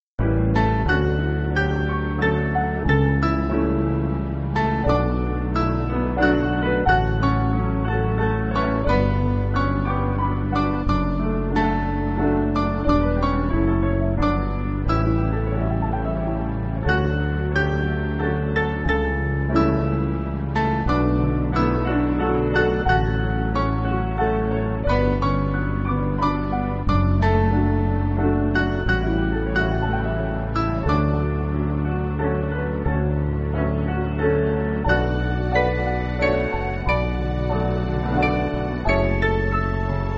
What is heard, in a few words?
Piano